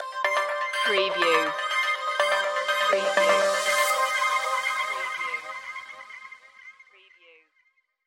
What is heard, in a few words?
streamliner stinger